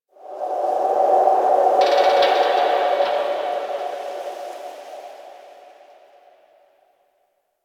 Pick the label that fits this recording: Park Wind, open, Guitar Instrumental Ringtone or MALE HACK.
Park Wind